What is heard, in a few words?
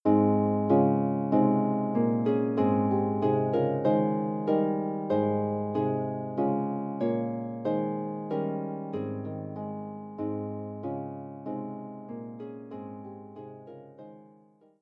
Harp